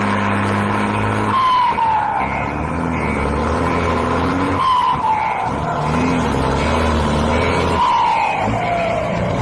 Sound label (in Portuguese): caminhao